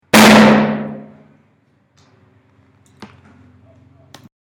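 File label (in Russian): balloon explosion single hit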